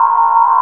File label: drone; E-MU Producer Series Vol. 8 – Platinum Phatt; Best of Phatt